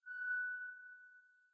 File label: air